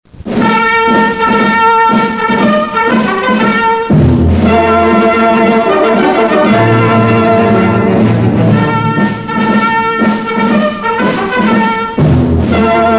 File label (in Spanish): Himno nacional cantado; MUSICA POLITICA